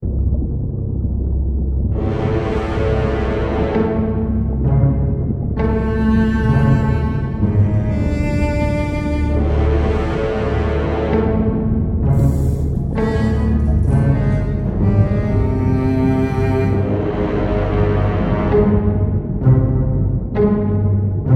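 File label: Dwarven Instrumental Ambience - Ancient Forge; shieldwall chronicles swords of the north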